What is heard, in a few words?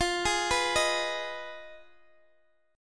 F Minor 7th Flat 5th, Guitar, Open G; x,x,15,13,12,13; down; chord shape